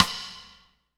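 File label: SD, snare drum, Real-Kit; Sound & Vision - Gigapack I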